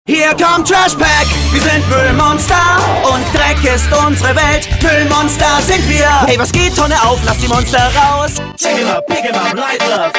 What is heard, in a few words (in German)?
Werbung Gesang Sprachgesang; Trash Pack Fireflyz